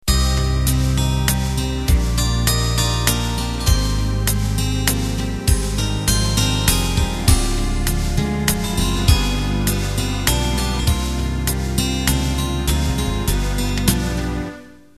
Waltz Intro

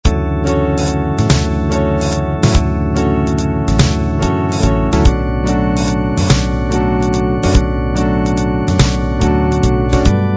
blues waltz

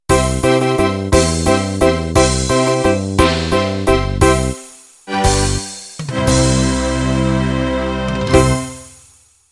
Viennese Waltz End

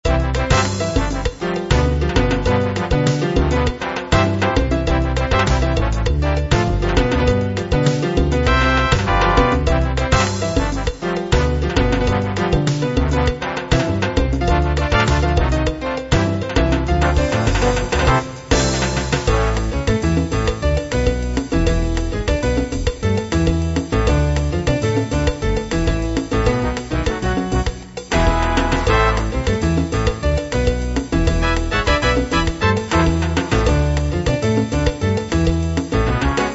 salsa

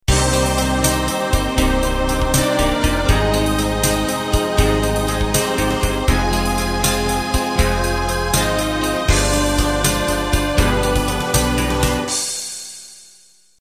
Slow Rock Intro

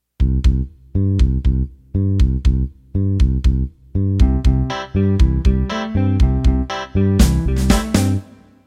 Motown Intro

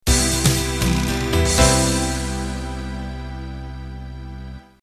12-8 Ballad End

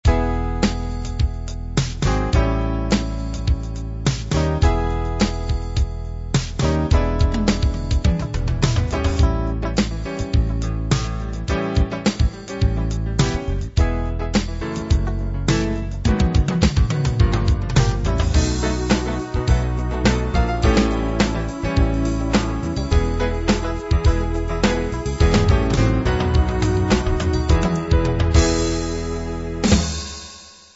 jazz rock

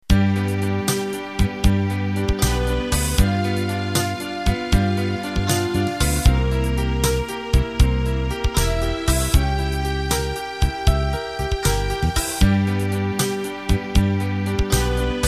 Slow Rock; Bass, Acoustic Piano, Clean Electric Guitar and Strings for hundreds more pop/rock/c&w 6/8 and 12/8 ballads

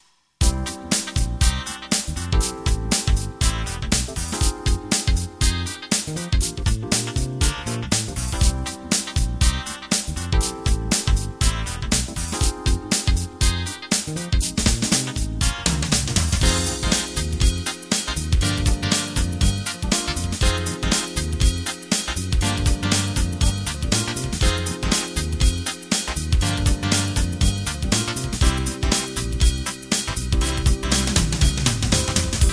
Rubber Funk

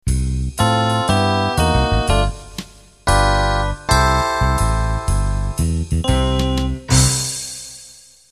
Ballroom Swing End; Ballroom Swing 2; Peppier and a little more energetic than Ballroom Swing 1 - with Fingered Electric Bas

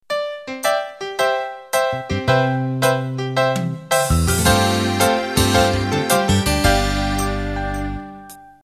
70s Radio Pop Intro

